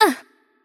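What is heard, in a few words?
Hurt Light, Japanese; bloom minigame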